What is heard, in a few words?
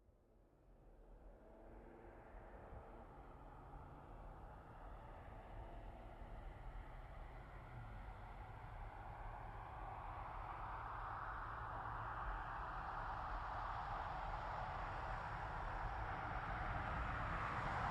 Truck GMC Savana By Corner Turn Up Stop Fast; 2010 GMC Savana; Trucks